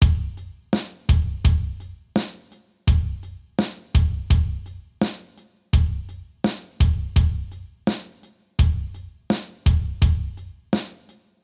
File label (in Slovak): vzorka rytmu